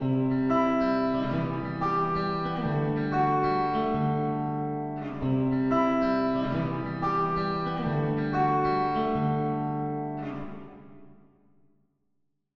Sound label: reverb chamber; guia de pedales de reverb